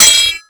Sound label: SW HIT, MORESWORD; Total Control - Action Cutz